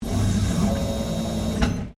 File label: Door Automatic Servo Driven Open Short Stereo, Motor driven automatic lifting door; Miscellaneous Doors